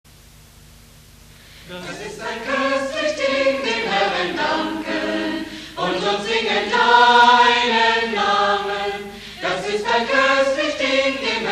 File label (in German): Chor Das ist ein koestlich Ding dem Herren danken